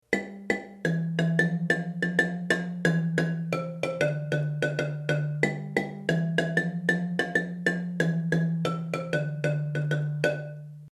low line, marimba

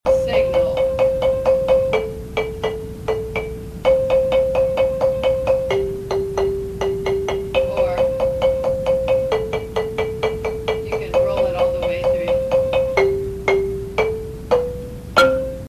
lead end signal, marimba

